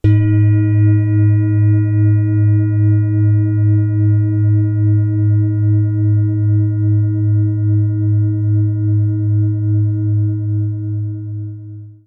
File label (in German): Klangschale; Neptun Hidalgo Eros